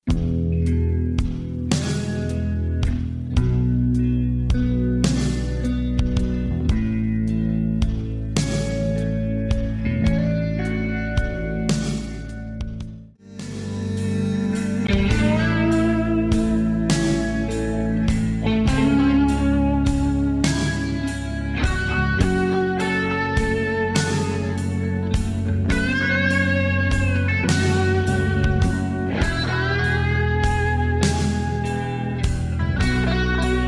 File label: leslie